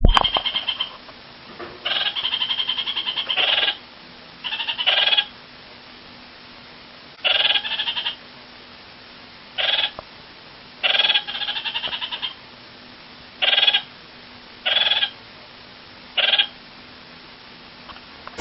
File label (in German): ziegen gerausche